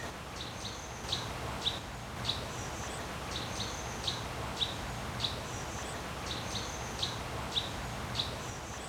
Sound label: SINIGER, birds